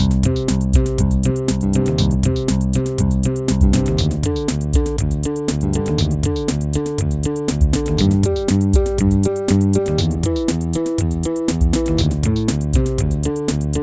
Musik

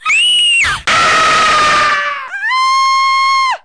schrei

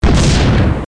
bumm

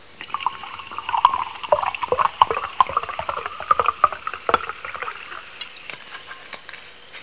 giess; wcanasta

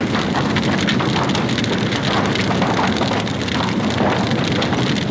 feuer; EMERGNCY; Emergency Demo

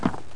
Schrank B; Loewe1

